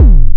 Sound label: Kick One Shot, Drums